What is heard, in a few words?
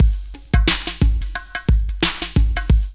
LOOP; E-Lab; X Static Goldmine 2; akai